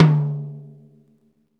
TOM TOM HI; Club-50 - Foundations Roland; Ext.Snare

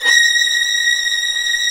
STR SW VLN, Vlns 8 fff, Agitato; String Master Series

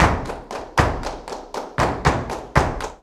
BULERIAS TAP, Flamenco

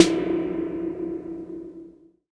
SNARE Tune, 808; Drum Kit; Polyend; Stazma & Concrete Collage; Spring808

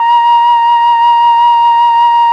FLUT1; ILIO; Propeller Island Legendary M400

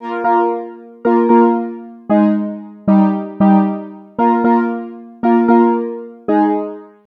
MOOG, Roots Disco; USB Soundscan vol.32; AKAI

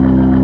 C2, B3 ORGANS; Greytsounds

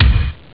bdrum; cameo; ST-XX Amiga Soundtracker Sample Packs

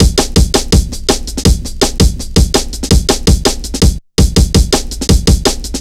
165, Drum Loops, Drum & Bass; Total Drum&Bass

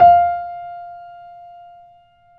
BOSENDORF

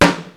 DPM3 kit, PEAVEY DPM; Rubber Chicken Software Sample Bank

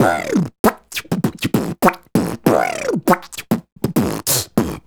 Beat Box, Hip-Hop and RnB Vocals

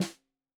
Snare 65 Reg Stereo, Deeply Sampled Drums

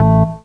chord one shot bomb A, Classic Chicago House; Sample Magic; Chord Shots

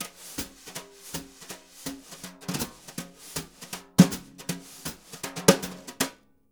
BRU, BRUSH; Univers Sons; Jazzistic

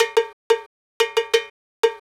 Cow Bell, Latin Percussion

